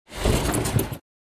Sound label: Sliding metal window